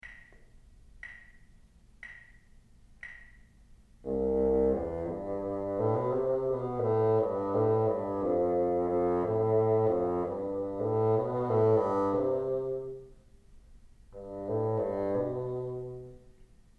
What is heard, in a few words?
teacher, bassoon